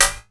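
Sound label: snare drum, drum & bass, 140 BPM; Ueberschall; Drum & Bass Resonance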